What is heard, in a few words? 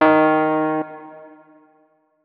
notes, keyboard, electric piano